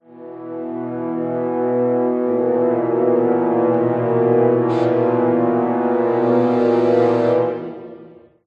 Amb Matinee

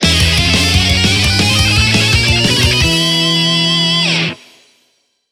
JINGLE gacha result; beyblade x xone

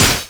Enemy Jet Skill Shield Reflect

